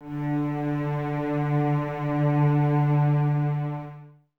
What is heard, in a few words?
CES D 3, String Ensembles Cellos; Miroslav Vitous; 90 s Sample CDs